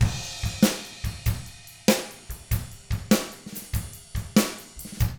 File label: rock 6/8, drums; ILIO; Double Platinum Drums 1